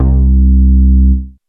SYSTEM 100 BS, ROLAND; Rubber Chicken Software Sample Bank

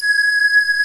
LINEAR SYNTH

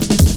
Drum Stepz Cut, Drum Loops 161.5; Zero-G Jungle Warfare 1, 2 & 3

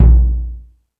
FX Sounds, Drum Machines

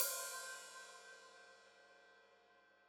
Hat Open, Hi-Hat

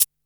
DR-660 Perc, Drums, Boss DR-660

